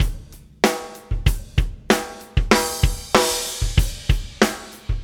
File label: Metal 94bpm, Drums; ACID MUSIC